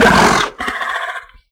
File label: baron voice; doom4